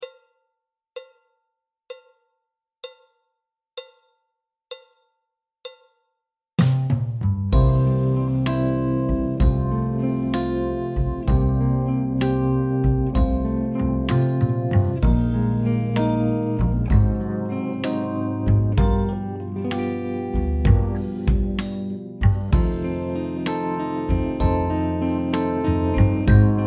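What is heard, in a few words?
backing track, Jazz; tenor, clarinet, flute; Jazz Syllabus Level 2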